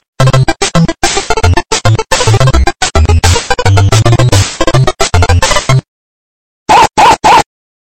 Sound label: Duck Hunt, SMS Tone